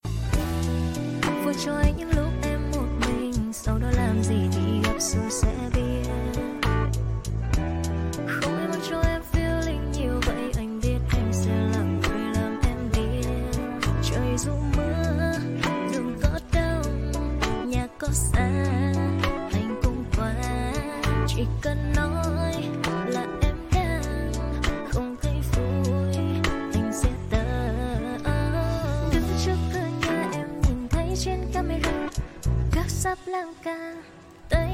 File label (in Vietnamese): Nhac Chuong Cover